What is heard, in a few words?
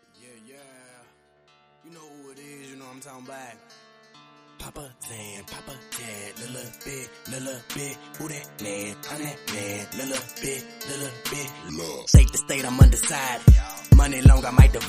Remix